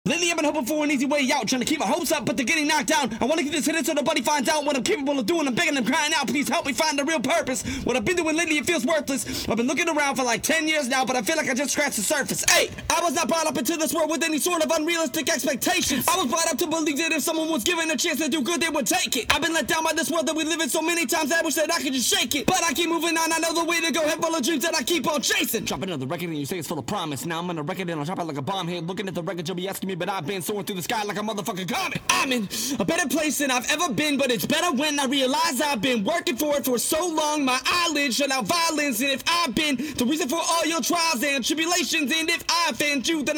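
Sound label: 151 E minor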